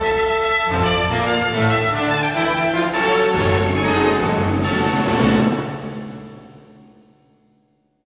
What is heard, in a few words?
battle win, music